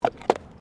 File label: Tram Horse Drawn Horse Step Gravel